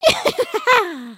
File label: Clap